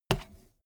bmx bike tire kick

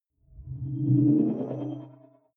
sci-fi display transmission damped

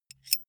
bullet scrape light

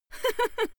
female laugh

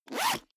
zipper backpack open fast

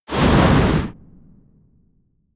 wipe

